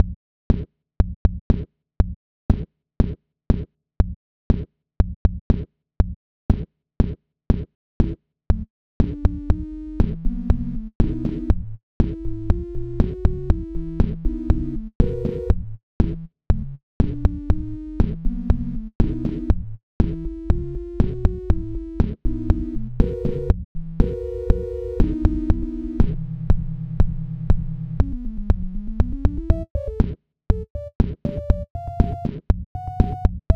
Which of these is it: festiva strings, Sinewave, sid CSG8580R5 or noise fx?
Sinewave